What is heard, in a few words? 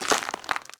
rubble step; Golden Crayon Sounds